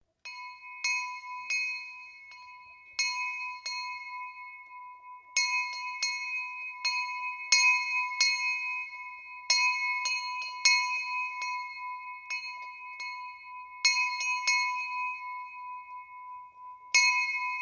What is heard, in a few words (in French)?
chato clochette